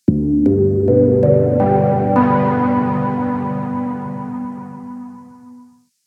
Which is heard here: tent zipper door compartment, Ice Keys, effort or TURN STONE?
Ice Keys